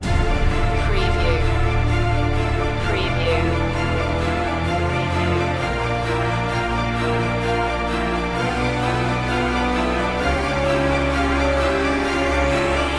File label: hybrid orchestra